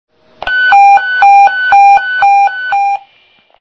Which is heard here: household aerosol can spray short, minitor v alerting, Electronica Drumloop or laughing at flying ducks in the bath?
minitor v alerting